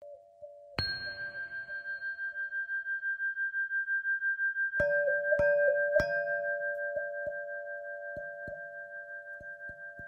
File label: Ding Sing